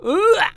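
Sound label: Mon Man ATTACK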